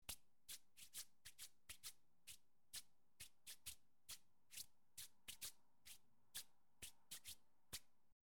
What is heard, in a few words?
Polyrhythm Hand slips short; Jamuary